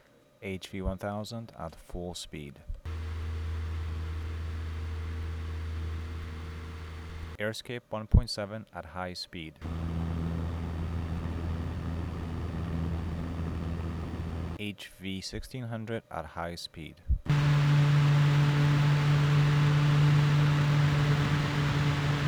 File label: whf sound